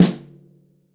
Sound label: bassy snare one shot f sharp key